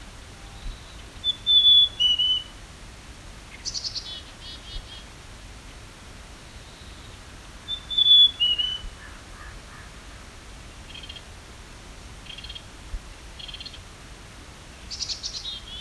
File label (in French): Mesange a tete noire; Steven Mlodinow